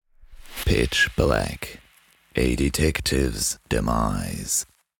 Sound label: Main Menu Start Game; Pitch Black - A Detective's Demise